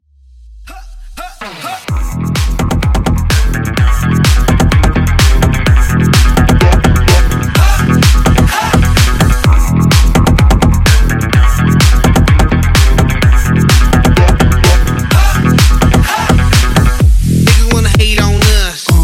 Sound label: Party Bootleg 127Bpm